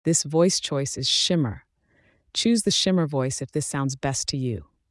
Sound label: Shimmer